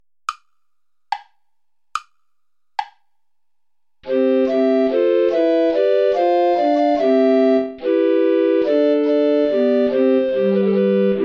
play along without alto recorder; praetorius michael; nach gra ner farb mein herz verlangt

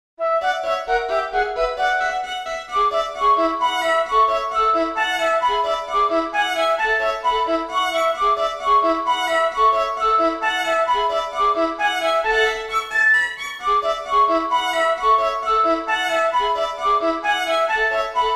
the musical snuff box for flute violin; lyadov anatoly

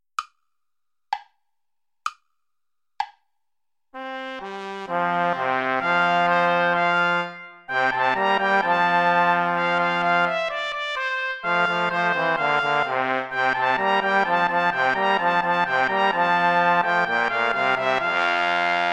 play along without trumpet; praetorius michael; psallite unigenito

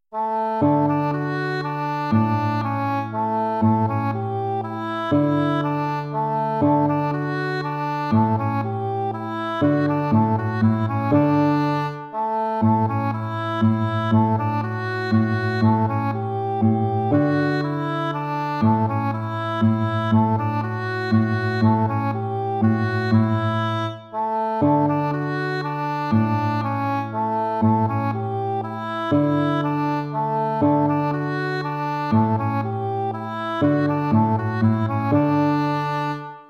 waltz; Carulli Ferdinando

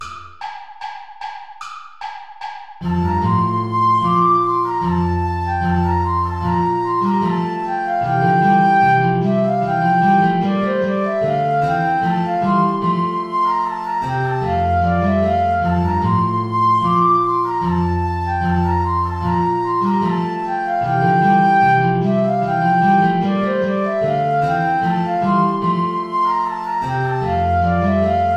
play along without guitar; praetorius michael; ballet des baccanales

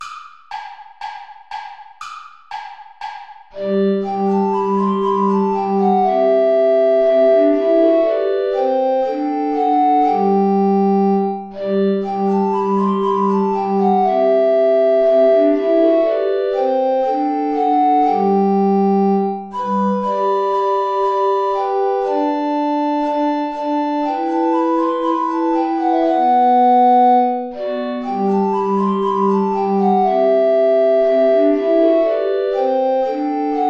play along without alto recorder; steuerlein johann mit lieb bin ich umfangen

